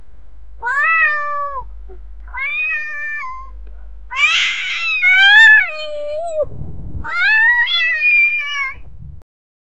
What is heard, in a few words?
cat is laughing